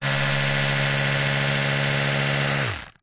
household electric toothbrush run dry static short close up; zapsplat